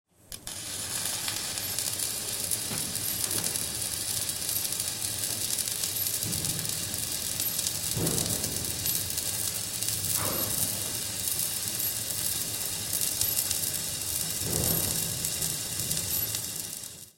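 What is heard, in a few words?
sounds of welding machine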